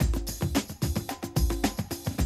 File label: 110, Classic Drum Loops